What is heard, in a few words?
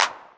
909 Clap, Novation Drumstation; Novation Drumstation V1